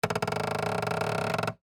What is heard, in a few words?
Creak Plastic, Texture, Creak, Plastic